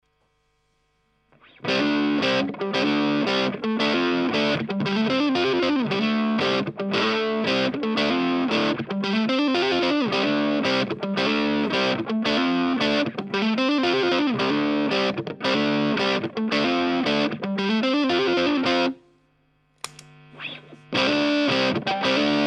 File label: bend single coil